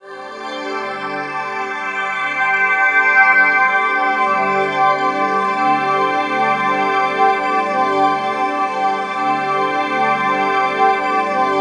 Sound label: beauty, ethereal atmosphere; USB Soundscan vol.13; AKAI